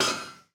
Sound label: metal hit; teardown ps5 windows xbox series xs gamerip 2022